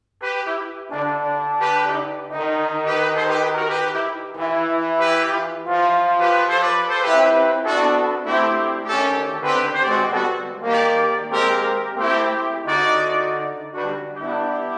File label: Spiritual Jazz Suite; Swing low, sweet chariot